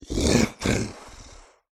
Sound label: Animal Mammal Carnivore Cat Spotted Leopard Snarl; animals; AdobeCC